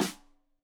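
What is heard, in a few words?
Snare; Deeply Sampled Drums; Stereo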